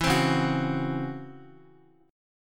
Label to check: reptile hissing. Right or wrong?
Wrong